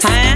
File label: haah